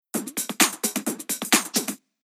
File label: Rhythm Loop